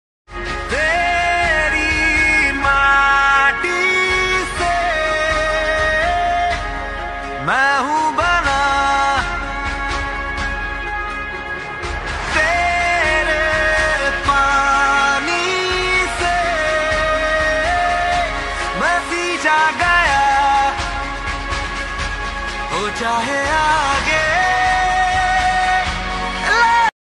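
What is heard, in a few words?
independence day ringtone background music; 15 august; happy independence day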